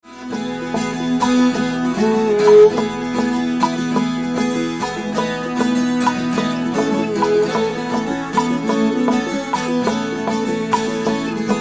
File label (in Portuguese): VIOLA VIOLETA; TRENZINHO DO CAIPIRA; VILLA LOBOS; elas instrumentais viola e violeta